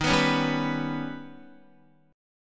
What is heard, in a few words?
Eb Augmented 7th Eb, Guitar; Eb+7 strummed; x,6,9,6,7,6; Eb+7 chord; JGuitar